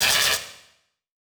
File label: Title Transition Blast Energy; Adobe; Production Element